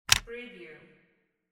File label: tape recorder eject